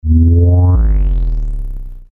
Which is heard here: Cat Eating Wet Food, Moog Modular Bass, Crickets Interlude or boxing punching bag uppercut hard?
Moog Modular Bass